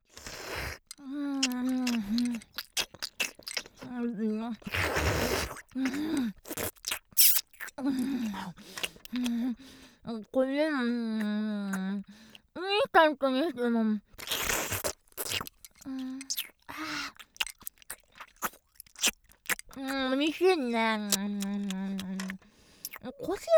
ゲップ＆咀嚼音; ゲップ＆咀嚼音より抜き; 効果音入り

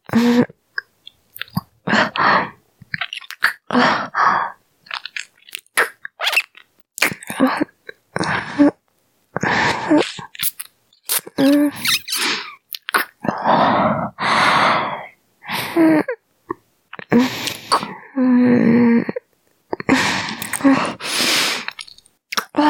濃厚, キス, ASMR

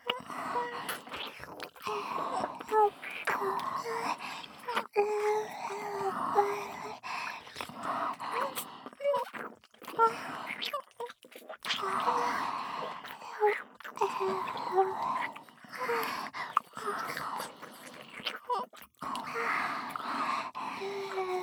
キス＋フェラループ

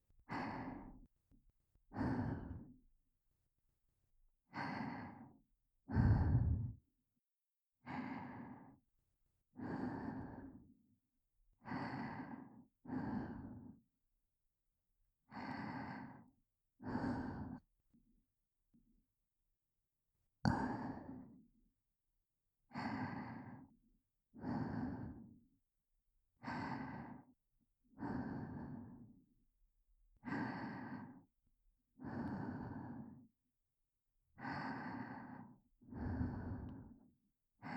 吐息のみ, 囁きブースト; 分身; ASMR

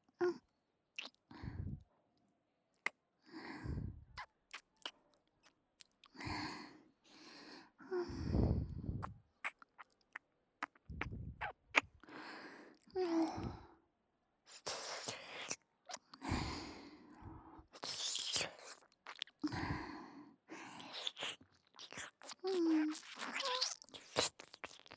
耳舐め左オンリートラック

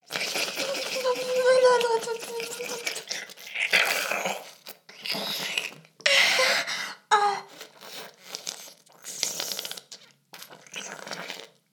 フェラ音声; ダメなメイド

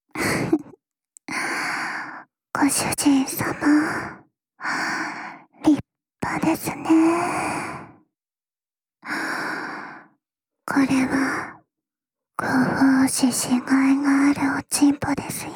ねっとり耳舐め＆手コキ SE無し

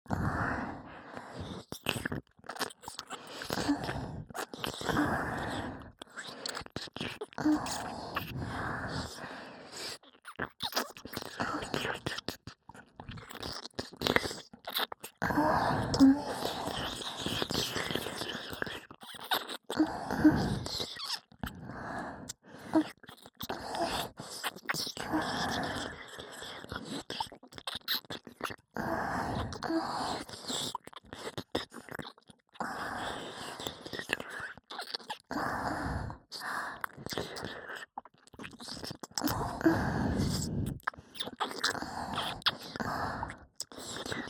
両耳舐めループ

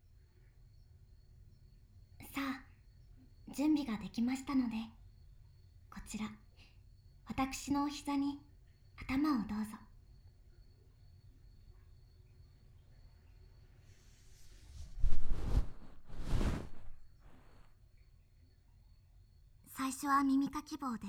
お膝枕 耳かき, ASMR

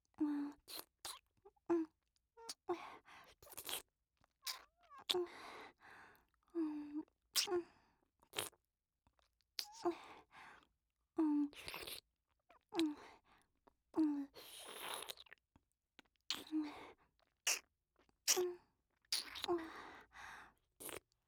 お姉さんの耳舐め ループ; 僕とお姉さんの音声; ループ音声集

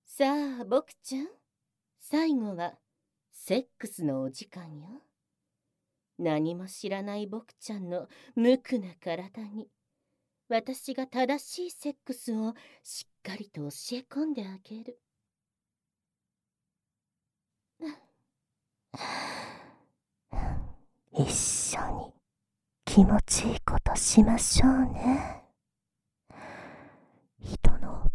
オホ声騎乗位; 効果音なし; オホ声騎乗位で骨抜き搾精

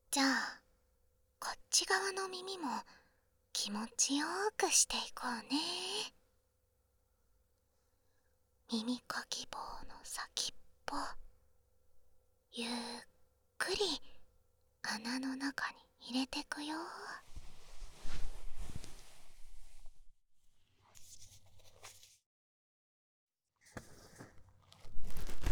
左耳かき; 恋人ってどんなカンジ？

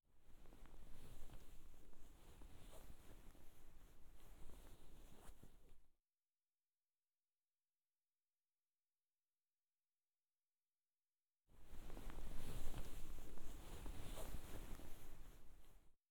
耳かき（左耳）ASMR

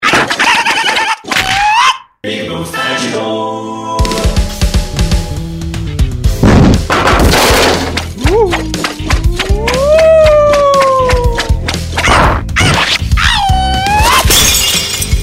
カンフーアクション; ASMR; 効果音; ぴぐみょん効果音素材集; ぴぐみょんスタジオ

